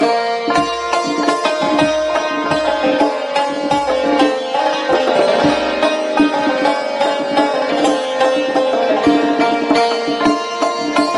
Santur

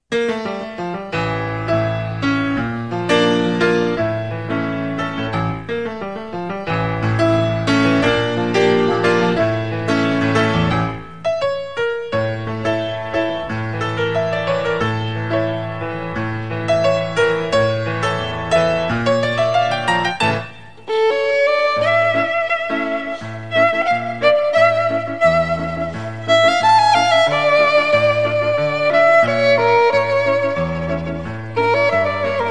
Violin

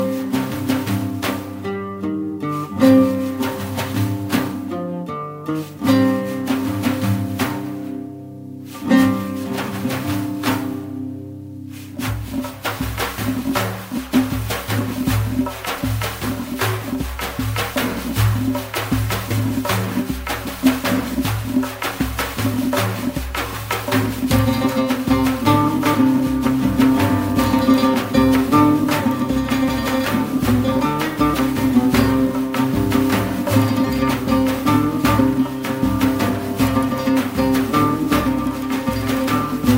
Oud

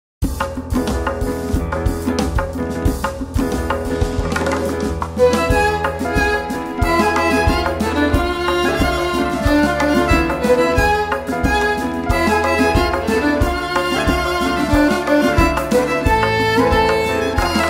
Violin

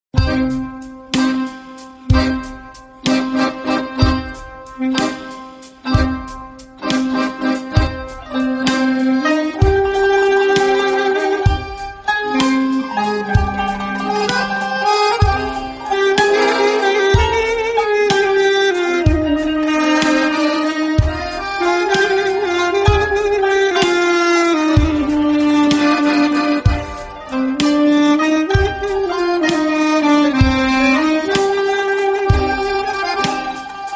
Melody

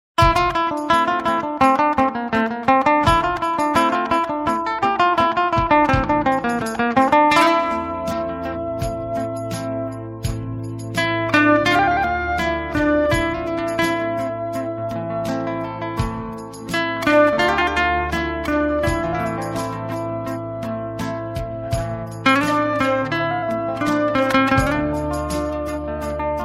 Guitar

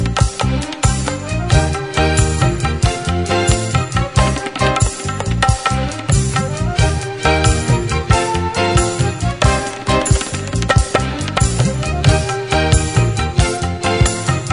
Flute

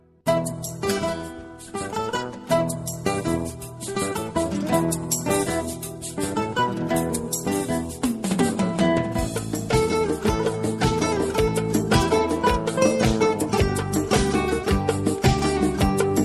Guitar

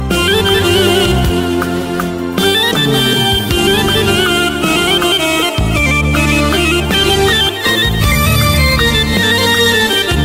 Accordion, play back

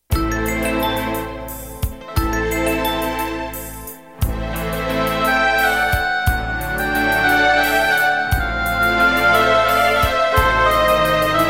Flute

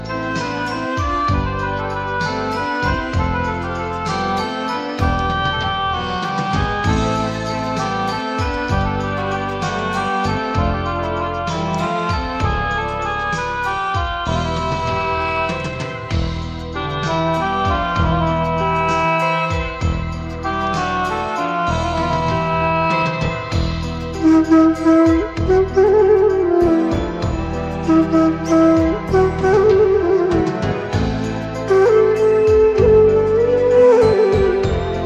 Ney

